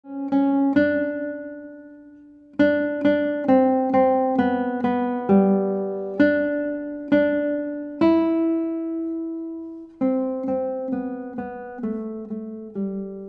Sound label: Guitar